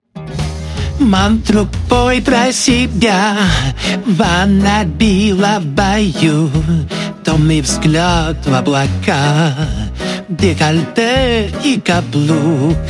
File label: Breathy Male Soul; RVC